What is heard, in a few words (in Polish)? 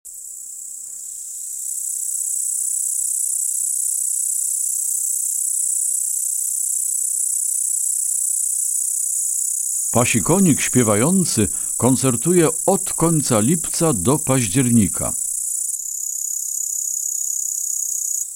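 Pasikonik śpiewający; Przyroda; Tajemnice puszczy; Kurs PTTK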